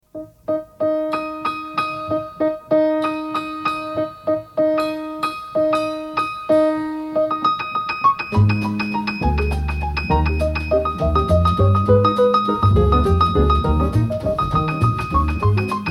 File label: Etude gis Moll; la Campanella